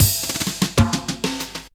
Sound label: Sausage Roll, Drum Rolls; Zero-G Jungle Warfare 1, 2 & 3; 90 s Sample CDs